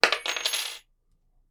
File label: Metal Key Falling On Wood